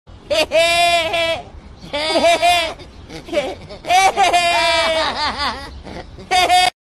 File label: Laughing Goats